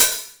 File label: 909 HH, TR-909; Best Service Dance Mega House Dance